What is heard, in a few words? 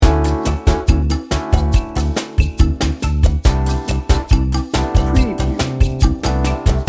lite pop jazz loop